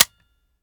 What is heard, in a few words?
fire first; gmod; viper